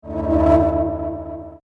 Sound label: Falling Through Fear, horror sound effect; Andrew Fleming; Twistedtracks